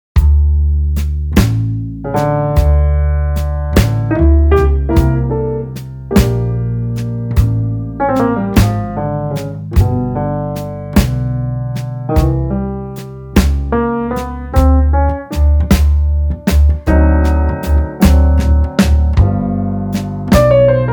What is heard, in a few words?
blues, 52 bpm